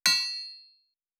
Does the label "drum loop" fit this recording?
No